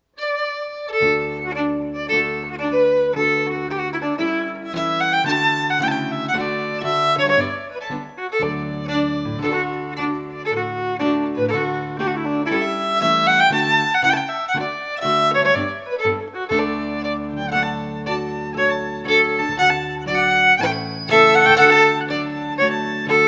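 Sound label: Strathspey, Scottish Fiddling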